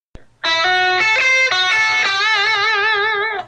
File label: mixing major minor pentatonic scales, guitar